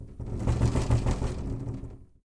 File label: Rattling Window; SyrinScape; City gone mad